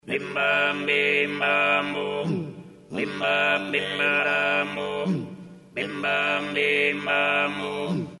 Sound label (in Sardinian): bassu contra